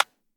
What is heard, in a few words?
disconnector; gmod; viper